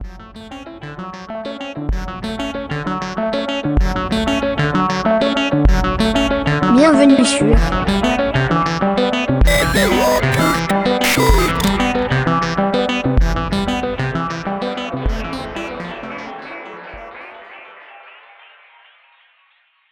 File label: jingle; FR